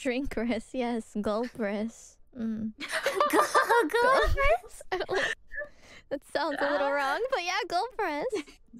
drink gulp

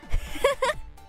giggle

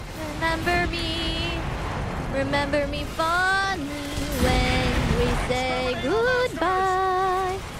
Singing; IRySinging

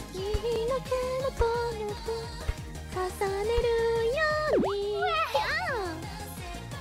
Eurobeat; IRyS

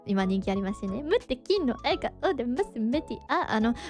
Singing; IRySinging

